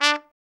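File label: BRA TRUMP, trumpet, brass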